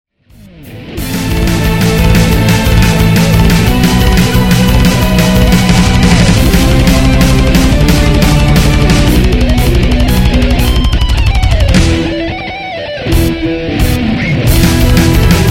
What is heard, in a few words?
intro guitar